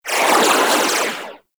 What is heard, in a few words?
Swarm Missile Projectile By; Weapons; Warframe; Sentinel; Helstrum